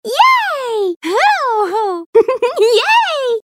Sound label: yaay laugh; princess peach